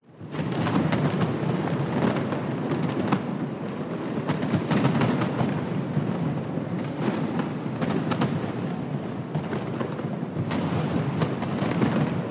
fireworks huge display reverb

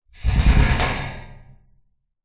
metal bars object run across rattle clunk

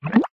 cartoon water drip; zapsplat

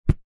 string ball set down wood; foley

